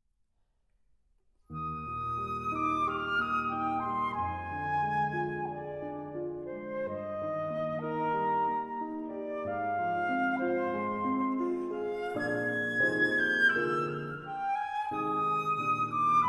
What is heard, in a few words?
Sonata in E-Flat Major For Clarinet and Piano, Allegro Amabile